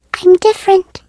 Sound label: turret stuck in tube